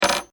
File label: Ring Drop Foley Spin; Drops; Objects; BBFX